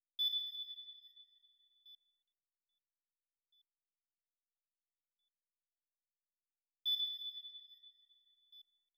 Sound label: beep